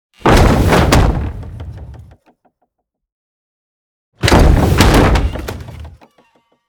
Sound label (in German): Medieval Katapult